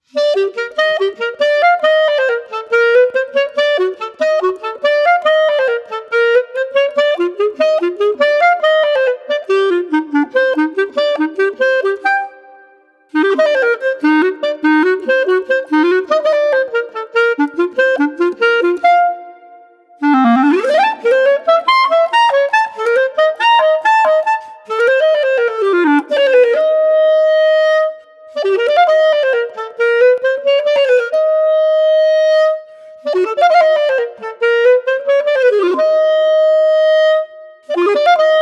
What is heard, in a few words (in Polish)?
Klarnet